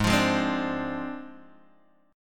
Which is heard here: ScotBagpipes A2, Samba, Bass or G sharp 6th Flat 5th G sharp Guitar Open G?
G sharp 6th Flat 5th G sharp Guitar Open G